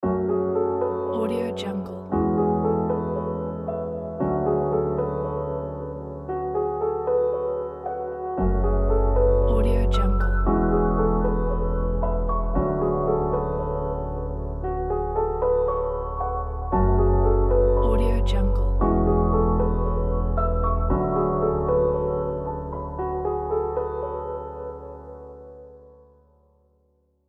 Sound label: dark tragic intense piano logo